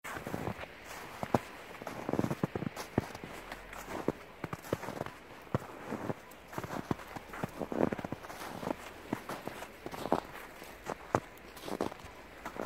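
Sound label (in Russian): man walks at night through a park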